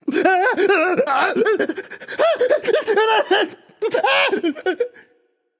fear; danzaburou

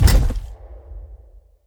giant run; smite; vamana

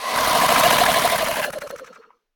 scorpion incap vox; smite; npc

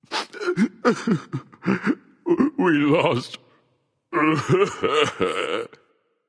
woohoo; smite; voicepack; poseidon

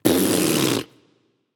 tongue; smite; tsukuyomi

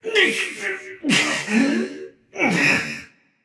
grunt lift, voicepack; smite; jorm

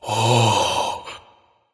"ah", discover; smite; voicepack; ullr

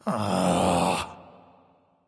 "ugh"; smite; voicepack; ullr

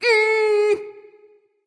tongue; smite; amaterasu

